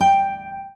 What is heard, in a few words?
Classic Guitar, notifications